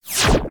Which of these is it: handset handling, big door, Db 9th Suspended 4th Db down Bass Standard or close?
close